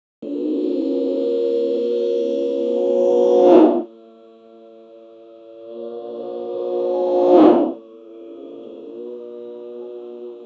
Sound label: crowd booing with low pit